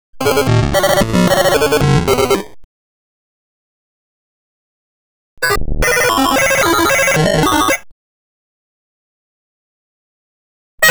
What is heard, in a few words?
tone